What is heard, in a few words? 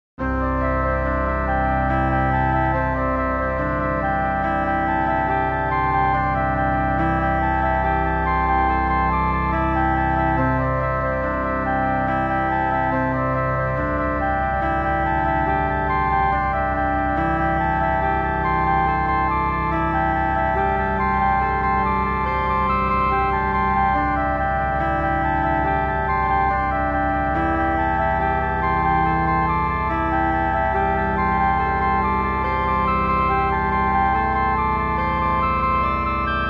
cello flute pentatonic; fractal music; Sloth canons